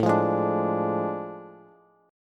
A Major 7th Suspended 4th Sharp 5th, Bass; strummed

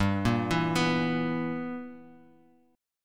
B Major 7th Suspended 4th Sharp 5th G

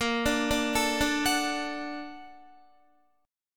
A sharp Augmented Major 7th A sharp Guitar; A#+M7 arpeggiated; JGuitar

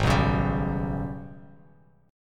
Ab Minor Major 11th Ab, Bass Standard; strummed; 4,2,11,12; JGuitar

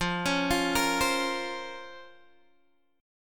Db Major 13th F

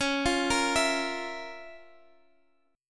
F Major 7th Suspended 4th Sharp 5th Db

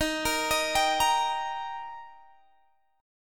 Eb Major, Guitar Half Step Down

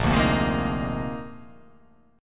A sharp 11th

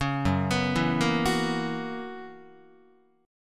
F sharp 11th F sharp Guitar; F#11; 4,2,2,2,2,5; arpeggiated

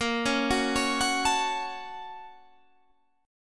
Bb Minor Major 7th Sharp 5th, Guitar, Standard; BbmM7#5; arpeggiated; 18,16,16,18,19,17; JGuitar

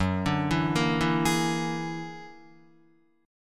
Gb Augmented 9th Gb down Guitar 4E,4A,5D,5G,5C,6F; Gb+9 arpeggiated

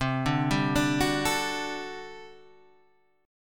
G Major 7th Suspended 2nd Suspended 4th C

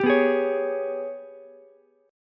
Ab Minor Major 11th down Ukulele C6; strummed; JGuitar

